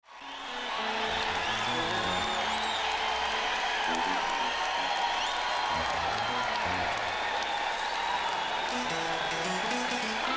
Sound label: Tuning